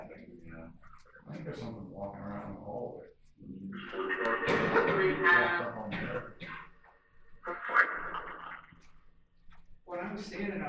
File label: Mean Teacher Room Phasma Box; poasttown elementary school investigation